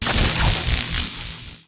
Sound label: Bow; nox